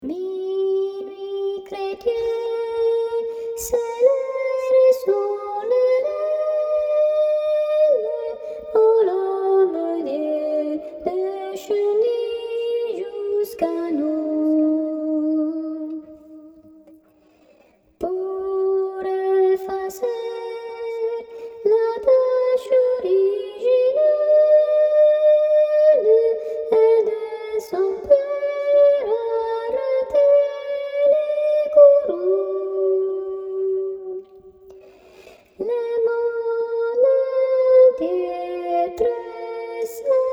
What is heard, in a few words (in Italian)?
cantique de noel, soprani